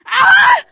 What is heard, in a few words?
hurt critical, teen girl, survivor voice; source engine; left 4 dead 2